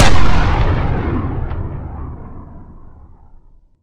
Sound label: explosion